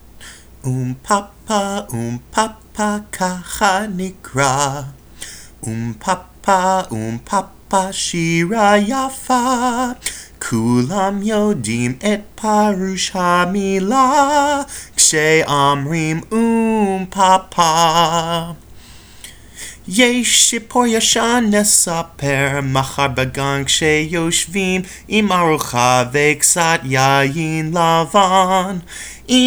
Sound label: oom pah pah (hebrew); Brotherhood OLIVER